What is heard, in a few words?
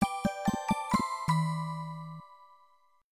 90s Text, notifications, Retro; Samsung Galaxy-S25-Ultra